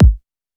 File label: Electro kick; Reference Beat